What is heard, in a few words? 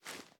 ar reload empty arm; weapons; gmod; viper